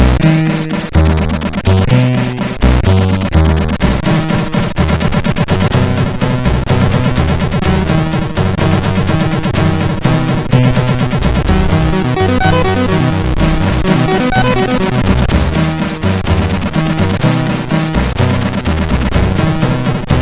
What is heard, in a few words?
Orchestra, CSG8580; hvsc